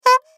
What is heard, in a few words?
Horn Bike Clown, Horn Bike Clown Honk Single Foley; Cartoon